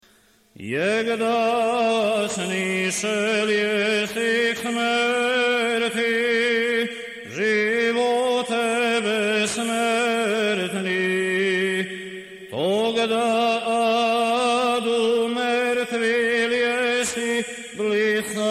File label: Osmoglasnik II glas